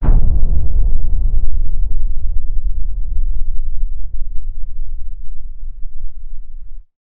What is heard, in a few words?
Missile